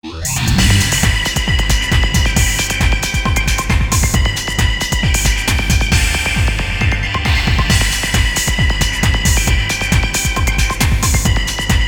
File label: Guitar Mix; Kurokami Shoujo Tai